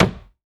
Milk Carton, household rhythm samples Hits

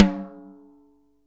snare dry; Real Mega Drums

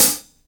XV Hat C, Roland XV-5080

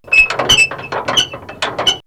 Creaky; X-Static Goldmine

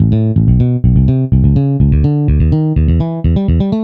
BSE 125 C, BASS LOOPS, House; Best Service ProSamples vol.10 - House; AKAI

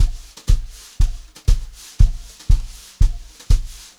120 JZ BEAT, Brushed Drum Loops

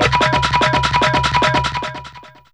Rhythm, Fairlight CMI IIe, Percussn

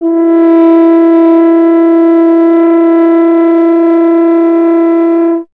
F. Horn; Miroslav Vitous; Solo Instruments VOL-2

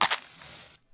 Match, household rhythm samples Hits

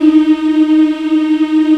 VOX African; Roland LCDP11 Africa VOL-1

